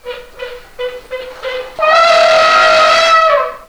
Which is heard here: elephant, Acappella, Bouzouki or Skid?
elephant